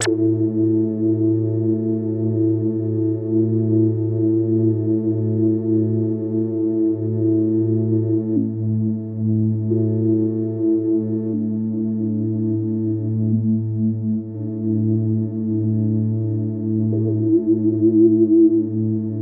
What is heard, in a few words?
zap drone; chromaris